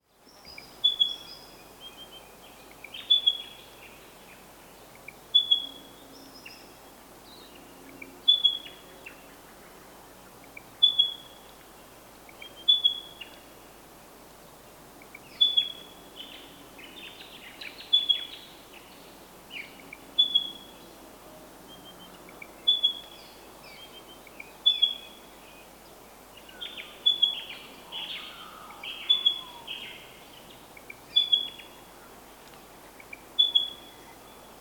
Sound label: Pardalotus Punctatus; Spotted Pardalote; Family - Pardalotidae (Pardalotes); Call; Call 2